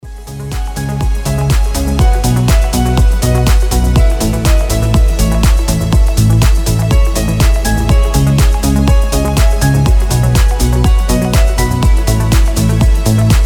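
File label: ringtone synth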